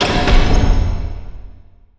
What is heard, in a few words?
Door Large Metal Stop; SFX Kit; Doors